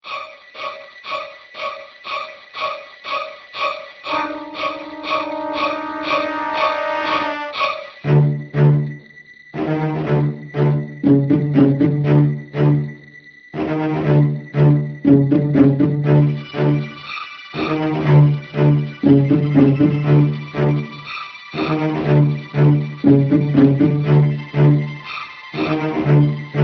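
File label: thriller tune ringtone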